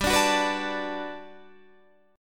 G Major 9th, Guitar, Standard; GM9 strummed; JGuitar

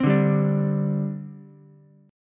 G Suspended 4th, Ukulele; strummed; JGuitar

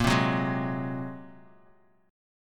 Bb 7th Suspended 2nd Sharp 5th Bb, Bass Standard; Bb7sus2#5; strummed; 18,15,16,13; JGuitar

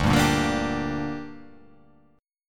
D Suspended 4th Sharp 5th D down, chord

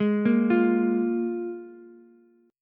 F Minor Double Flat 5th, Ukulele; Fmbb5 arpeggiated; JGuitar

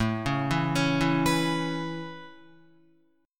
A Major Add 9th A Guitar, chord; Aadd9 arpeggiated; JGuitar

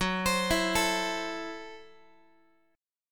F sharp Minor 7th Flat 5th F sharp Guitar; arpeggiated; JGuitar

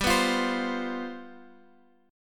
G 11th G, chord

